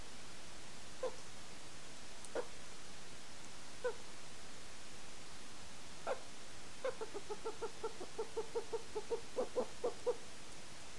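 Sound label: golden eagle aquila chrysaetos; orla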